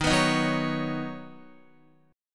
Eb Minor 7th Sharp 5th Eb down Guitar; strummed

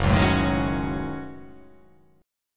F sharp Minor 6th Add 9th

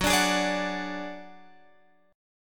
F sharp Suspended 2nd Sharp 5th, Guitar, Drop D

